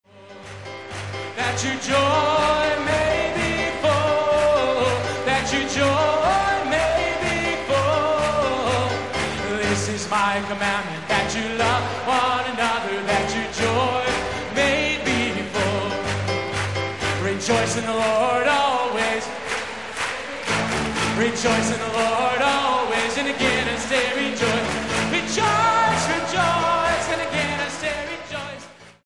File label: scripture song medley